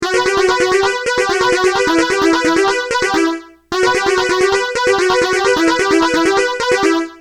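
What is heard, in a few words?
phase shifter fast; x5dr; maestro ps1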